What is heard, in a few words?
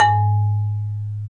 Bass Marimba